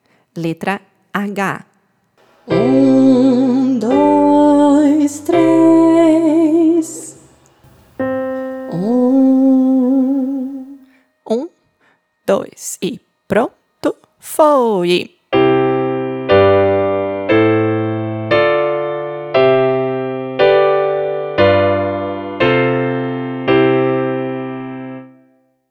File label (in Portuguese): Solfejo Melodia Solfejo Melodico